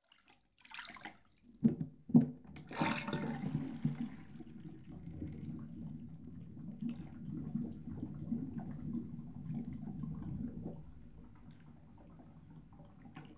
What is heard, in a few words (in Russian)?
Voronka vodu spuskajut v vannoi